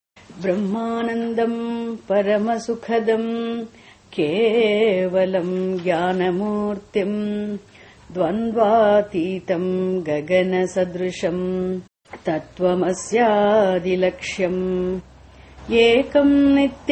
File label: Guru Gita mantra; mantras stotras